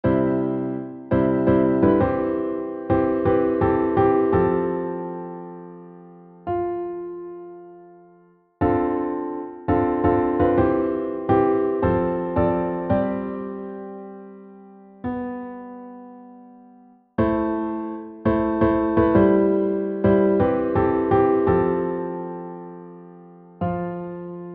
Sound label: piano